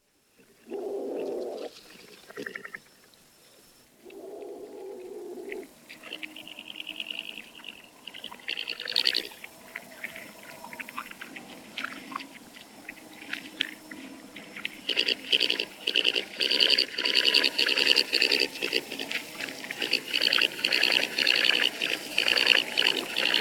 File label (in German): Baummarder Knurren; haarwild